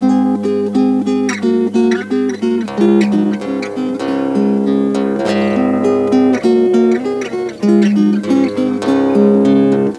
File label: Acoustic